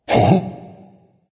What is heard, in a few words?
surprise dragon; fafnir